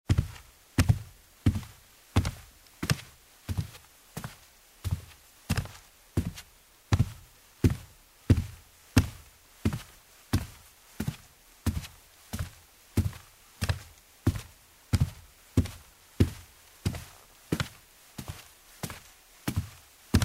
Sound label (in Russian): шаги по ковру в тувлях